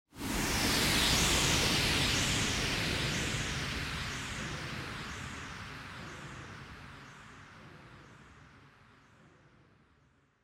Motion Speed Lines Delay

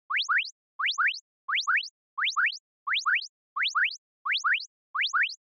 Onoma Panic Slow

